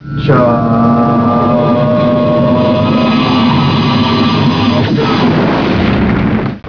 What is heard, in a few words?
WEIRD